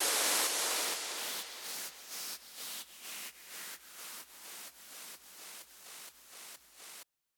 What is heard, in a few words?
FX Downlifter SD; Multi Drum Loop 05